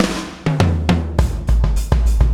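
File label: BLOW FILL left, BLOWUP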